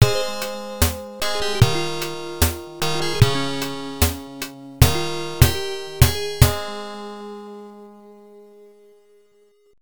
Game Over, FM; Thunder Blade; master system

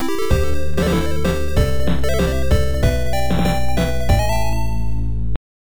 Bonus Stage Failure; Shanghai III

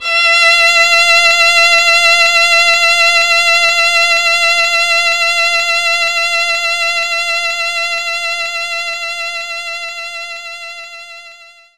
Violin F5

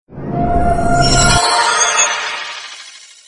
level up sound; Arc of Templar

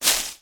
footstep foliage; Scrat

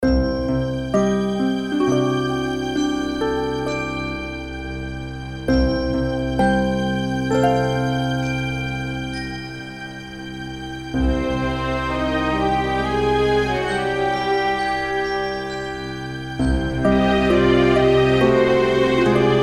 Bgm Human Forest

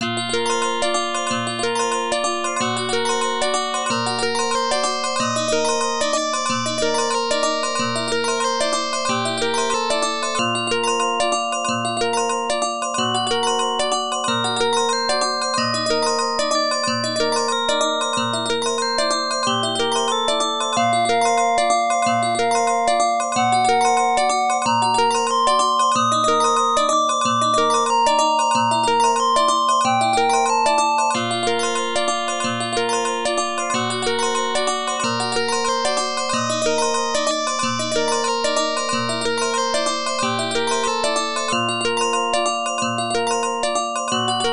All Clear; Section Z; arcade

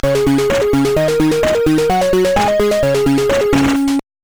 Race Result; Micro Machines

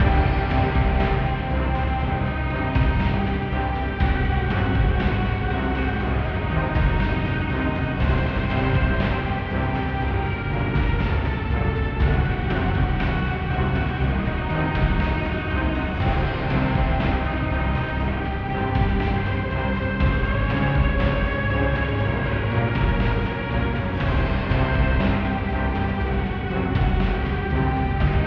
Final Boss Music Fight; Robbie Swifthand and the Orb of Mysteries; PS4

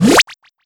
Item Box

